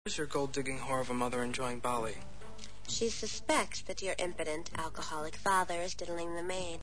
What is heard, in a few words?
diddling